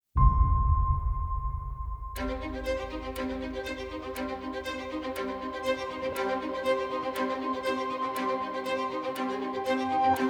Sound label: hybrid engine, strong epic orchestral